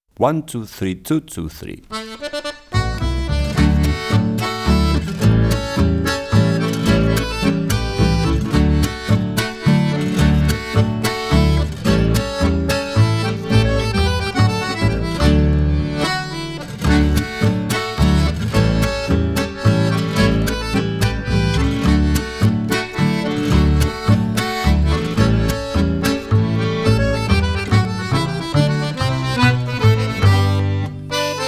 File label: backing